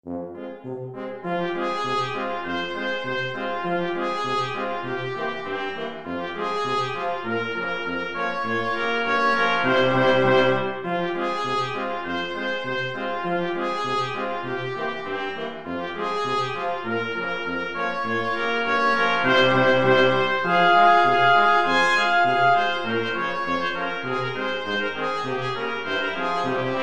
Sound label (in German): blechblasquintett